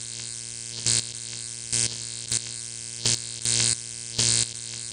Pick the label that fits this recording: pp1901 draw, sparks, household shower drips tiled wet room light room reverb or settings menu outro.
sparks